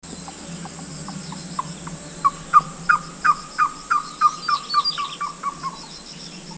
Lesser Coucal Ssp Lignator Song Guangxi Shanli; Cris de Coucal rufin; Chant et cris enregistrés le 18 juillet 2012, en Chine, province du Guangxi, à Shanli

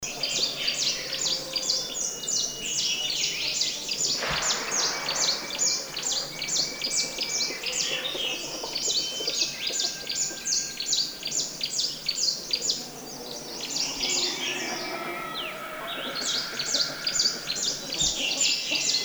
Chinese Leaf Warbler Song; pouillot du sichuan phylloscopus yunnanensis; Guangxi Beishan Longfei

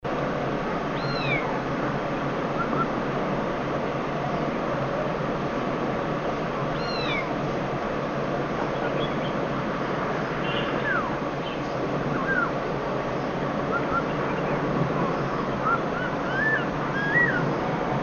Black Throated Laughingthrush Ssp Chinensis Call; garrulaxe a joues blanches dryonastes chinensis ssp chinensis; Shenzhen Wu Tong Shan

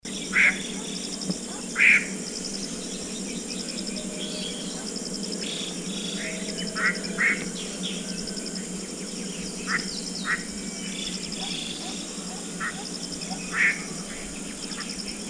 Chinese Hwamei Call; garrulaxe hoamy garrulax canorus; Ssp Canorus; Guangxi Dai Wian Near Beishan

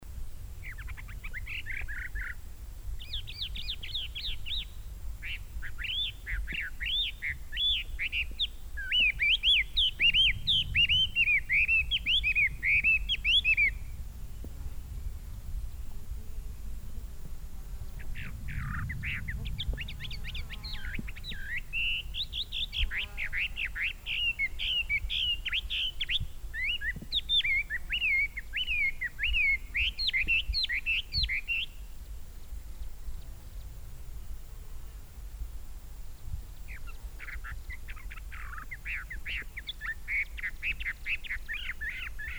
Chinese Hwamei Song; garrulaxe hoamy garrulax canorus

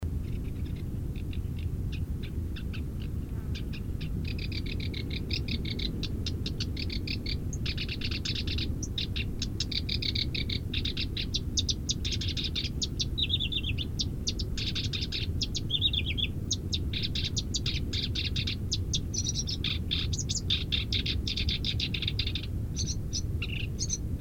Black Browed Reed Warbler Song, Fujian Changle

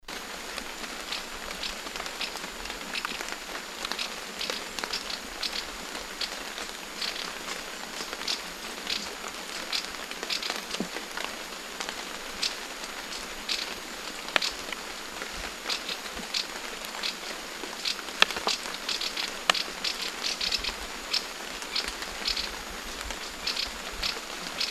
Eyebrowed Wren Babbler Ssp Laotiana Alarm Call; petite turdinule napothera epilepidota ssp laotiana; Guangxi Da Yao Shan

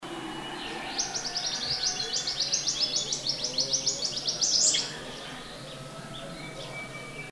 Chinese Leaf Warbler Call; pouillot du sichuan phylloscopus yunnanensis; Guangxi Beishan Longfei

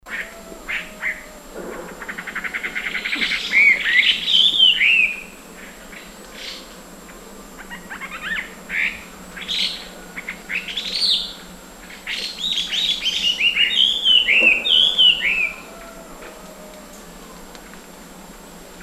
Chinese Hwamei Song; garrulaxe hoamy garrulax canorus; Ssp Canorus; Guangxi Beishan

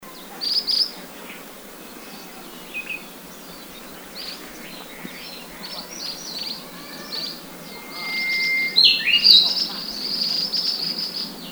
Ashy Minivet Group Call; minivet cendre pericrocotus divaritacus; Guangxi Shanli

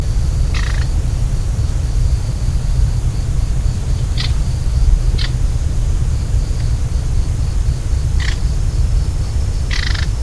Manchurian Bush Warbler Call; bouscarle de manchourie cettia canturians

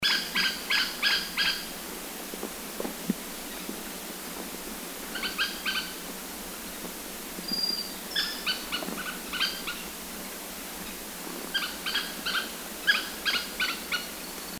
Bay Woodpecker Ssp Sinensis Call; pic a oreillons rouges blythipicus pyrrhotis ssp sinensis; Guangxi Da Yao Shan

